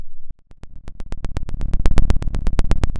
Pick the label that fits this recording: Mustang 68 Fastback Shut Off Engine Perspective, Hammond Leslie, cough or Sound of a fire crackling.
Sound of a fire crackling